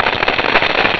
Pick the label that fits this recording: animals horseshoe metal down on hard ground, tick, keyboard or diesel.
tick